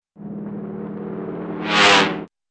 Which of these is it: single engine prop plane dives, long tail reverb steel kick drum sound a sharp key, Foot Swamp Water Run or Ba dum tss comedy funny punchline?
single engine prop plane dives